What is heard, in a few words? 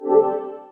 ps4 back button